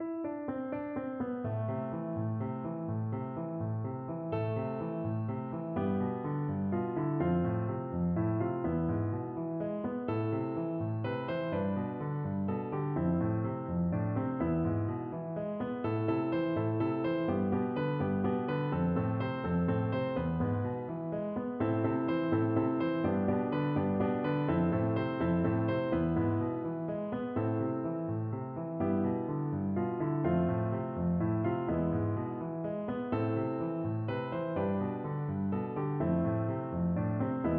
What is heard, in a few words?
spanish violin, piano